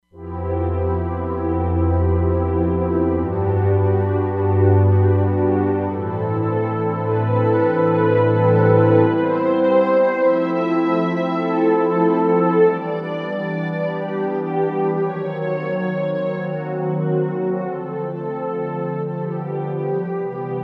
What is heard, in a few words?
thick carpet